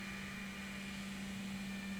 pulses, BALAENOPTERA; MONACO 2022-07

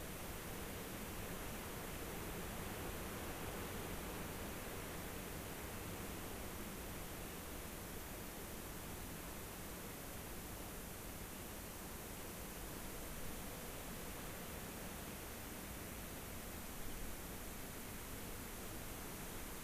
rayol farm; SEAMED

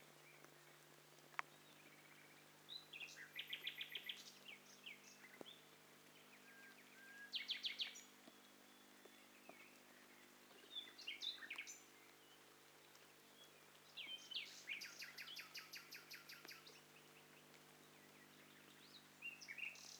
STEREO BIRD COCKTAIL PARTY

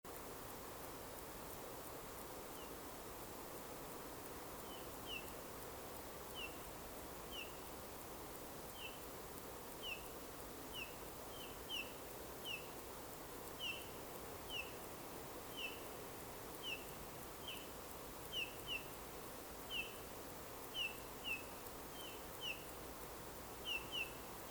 Pyrrhula pyrrhula; SETE

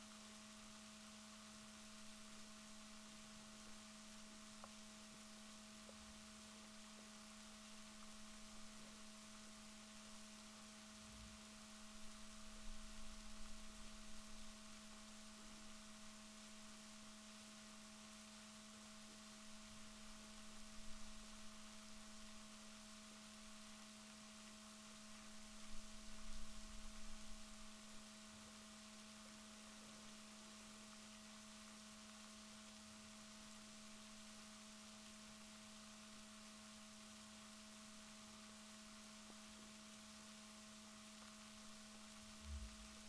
rorquals positif, Balaenoptera Physalus Chile

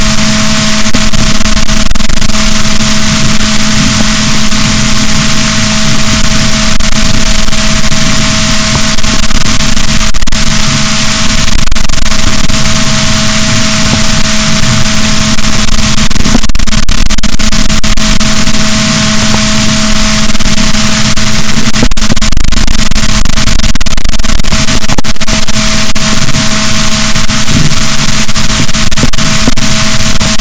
rorquals negatif, boat

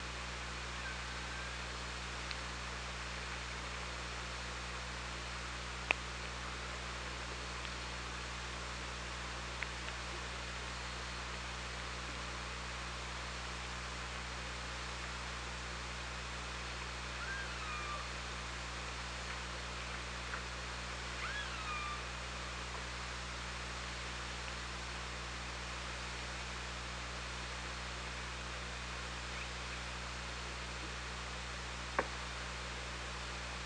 OrcaLab

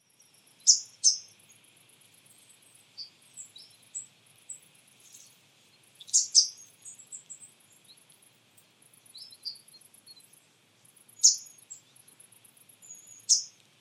Poecile palustris; SETE

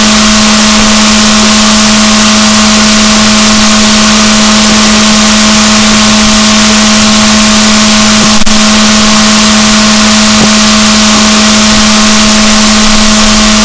rorquals negatif, boat

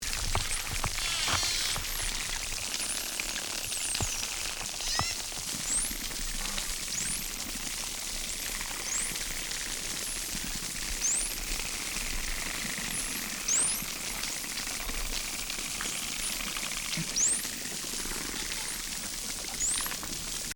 Spinner, Voices in the sea

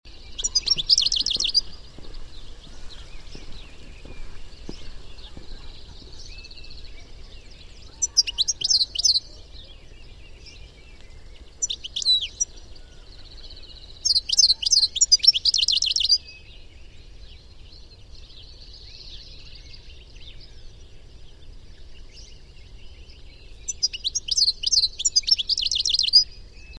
Carduelis carduelis; SETE

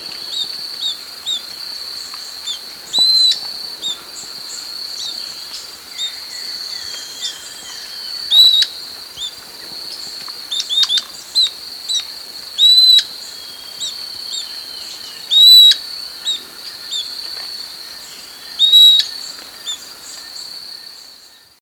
bird

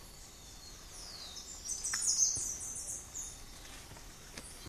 bird

